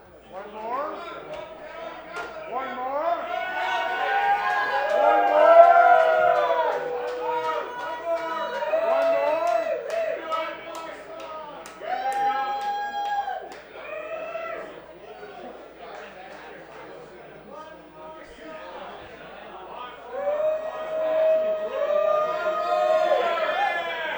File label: Crowd; Bluegrass